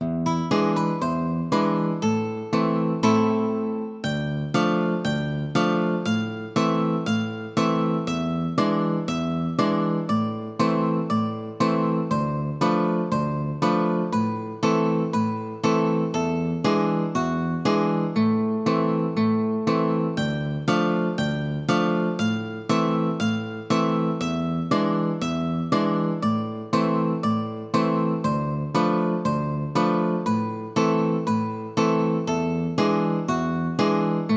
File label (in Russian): tsyganochka accordion